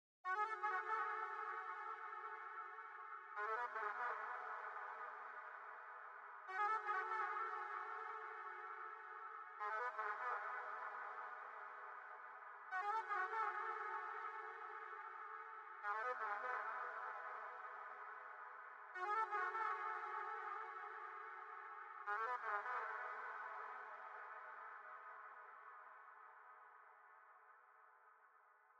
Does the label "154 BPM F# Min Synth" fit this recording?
Yes